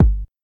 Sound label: rich low end kick g key, Royality free bass drum one shot tuned to the G note.